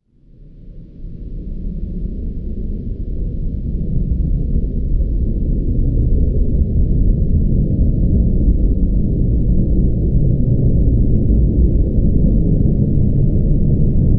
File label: destroyer, vehicles strogg flyer; Quake 4 Alpha